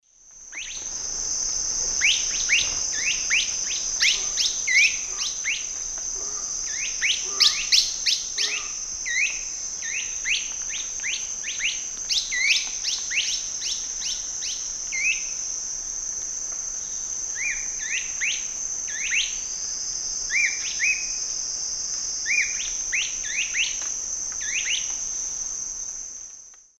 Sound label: Megastictus margaritatus, calls pair, S. America Eastern Lowlands